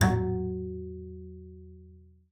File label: bass, F#3; COL LEG; Xsample VOL-5